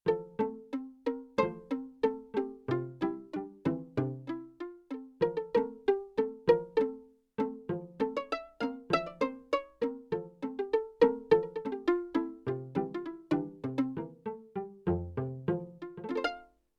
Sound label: Pizzicato Strings; Yamaha TX16W